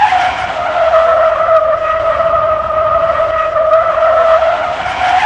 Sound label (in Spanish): EXT DERRAPE SECO, F1; AOS F1 2022